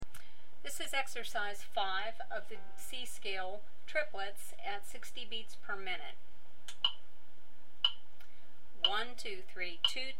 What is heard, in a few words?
C Scale Triplets 60bpm, mandolin